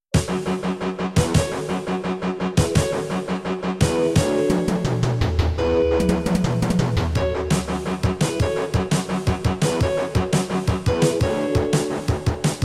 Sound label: Intro, Kawai GMega; 4D Sports Boxing